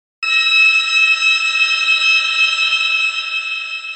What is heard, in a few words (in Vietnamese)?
Âm thanh ma sát kim loại vang vọng